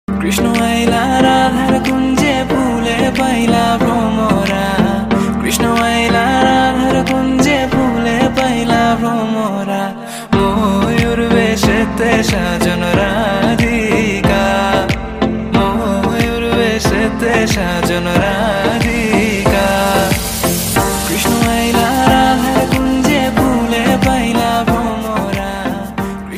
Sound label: Bangla Love Song Ringtone; ringtones